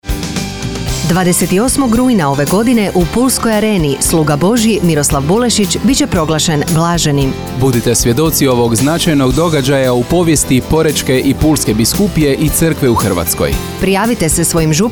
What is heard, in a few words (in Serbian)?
jingl prijave